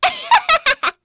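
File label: Vox Casting, Korean; Pansirone; kingsraid; voices heroes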